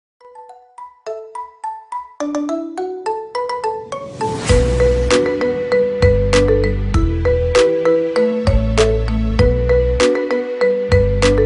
marimba ringtone remix

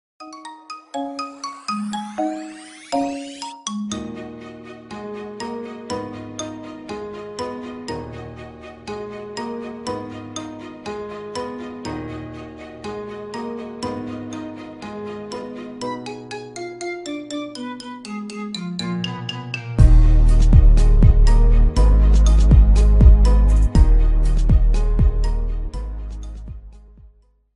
marimba ringtone remix

